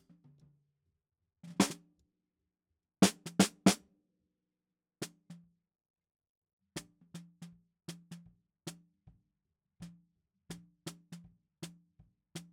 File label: SNR BTM